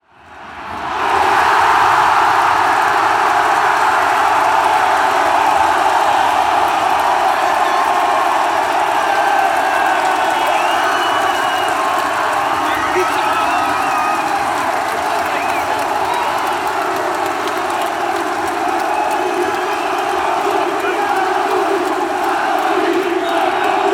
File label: fanchants